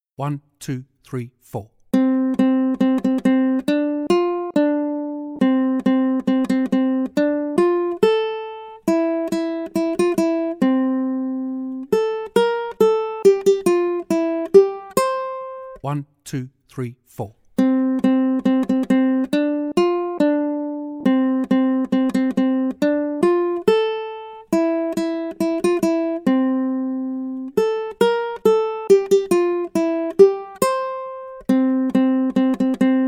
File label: Accompaniment, ukulele